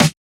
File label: Snare; FLPRO